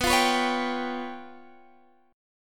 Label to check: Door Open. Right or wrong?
Wrong